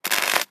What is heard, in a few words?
ambient port; World Of Warships; port3d